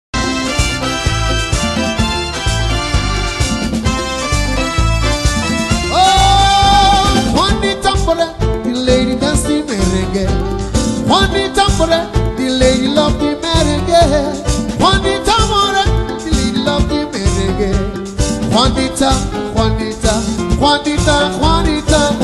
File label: Exobeat